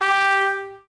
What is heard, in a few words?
Trumpet tone; game rip; lego my style preschool